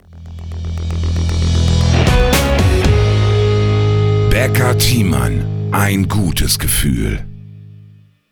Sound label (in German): Soundlogo; BT Kay; corporate sounds produktion